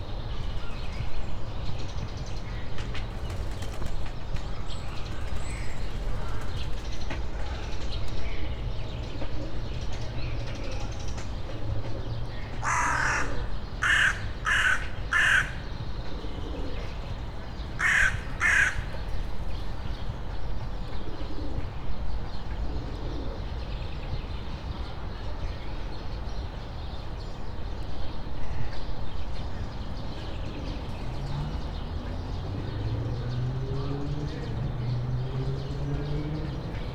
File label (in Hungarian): térhatás, szürke varjú; debreceni zoo 2012